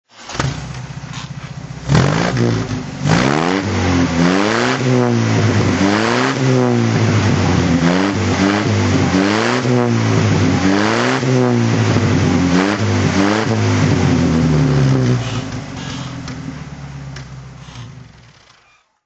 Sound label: austin healey 100 4 bn1 throttle